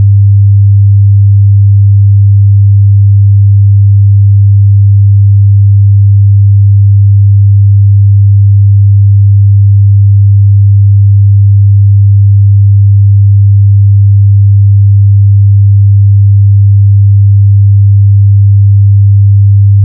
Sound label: 100hz